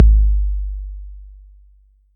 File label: 808's